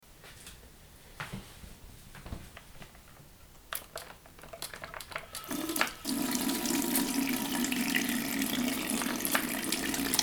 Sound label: washing and drying hands